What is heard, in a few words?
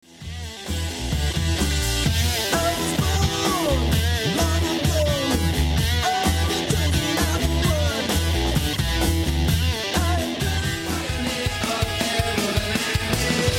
Medley Metro Rock